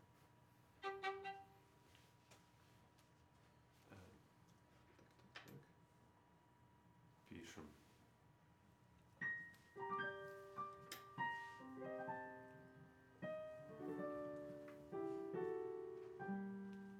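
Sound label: Viola C minor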